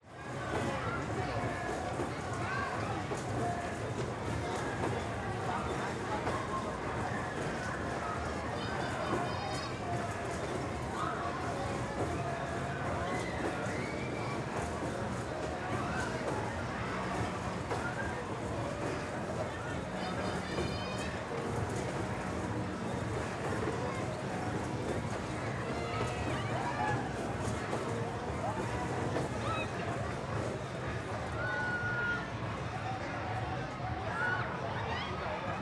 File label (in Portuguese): Brinquedo Minhocao do Parque Nicolandia; maquinas